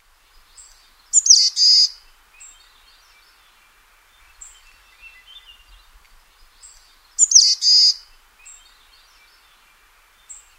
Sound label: boreal chickadee